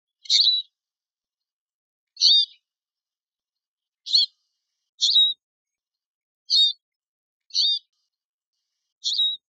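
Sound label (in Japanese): mahiwa call, siskin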